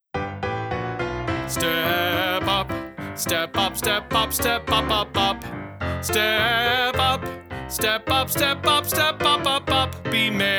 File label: guide vocal